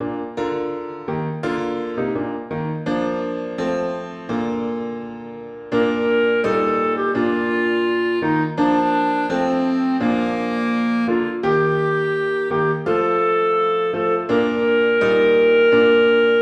joy to the world clarinet piano

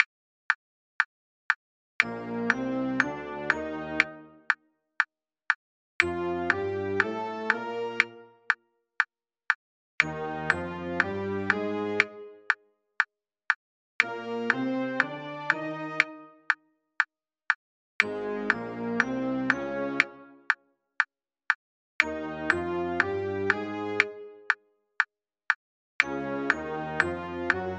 major scales in tetrachords

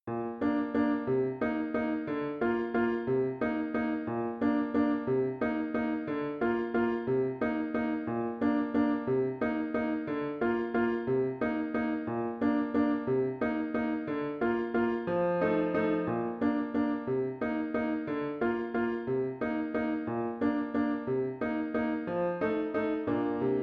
jig accompaniment; elementary solos accompaniments

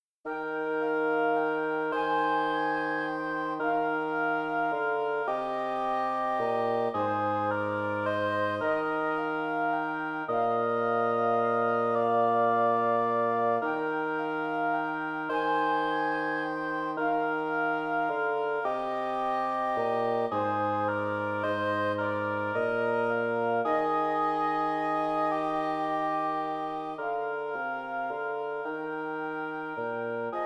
hymn

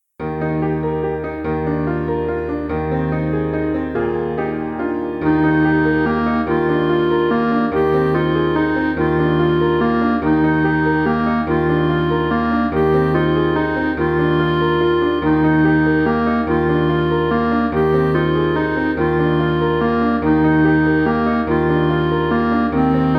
rondo

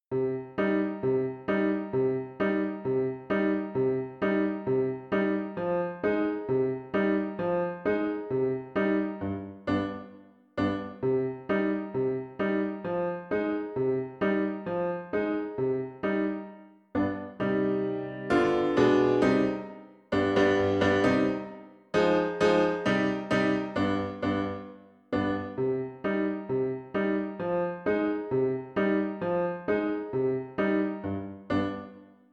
russian dance accompaniment; elementary solos accompaniments

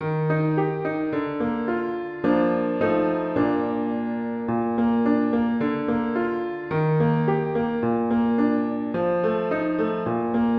deck the halls piano accompaniment

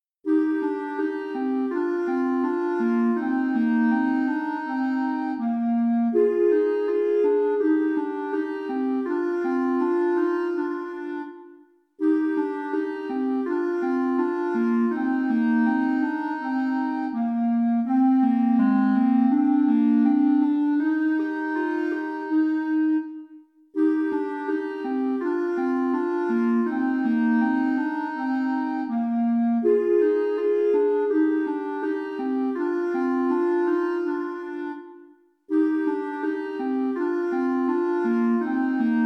nightsong

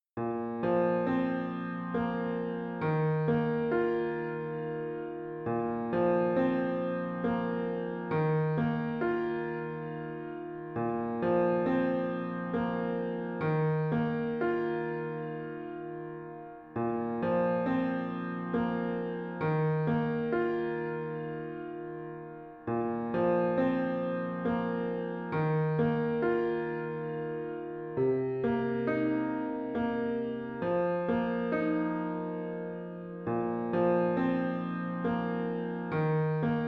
lullaby accompaniment; elementary solos accompaniments

